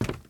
ladder, step

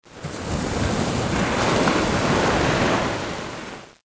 wave, water; matmos hl

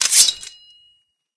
sword draw; matmos interactions

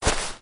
grass, step

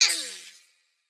attack, mob slime

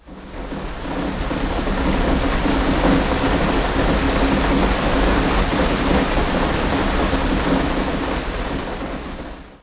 rain on window; matmos hl; weather

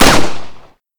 USP Shoot; Modern Weapons Pack

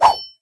sword attack; matmos interactions

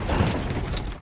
minecart; matmos extras

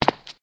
stone, step

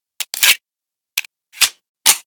colt Reload; Modern Weapons Pack

